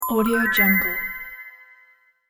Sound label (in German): funkelnde Glocken